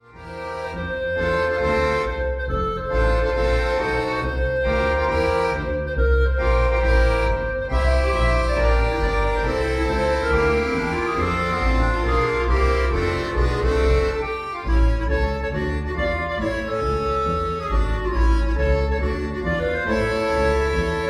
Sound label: Trio Computer